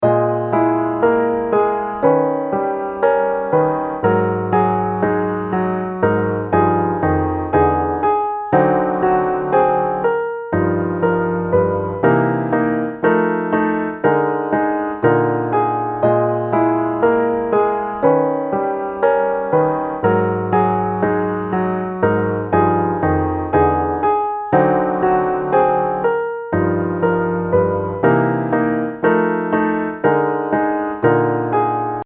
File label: piano